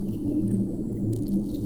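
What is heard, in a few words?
Music Dpad Evaporate 145; from dust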